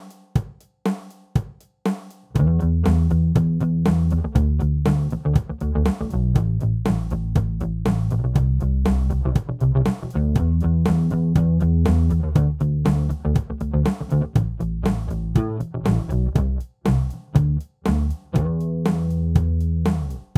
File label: Rock Rhythm USB